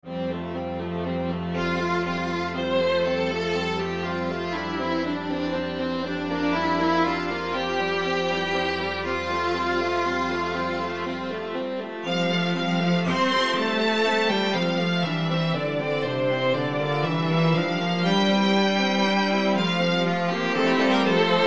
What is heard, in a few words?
strings; Praise to the Lord the Almighty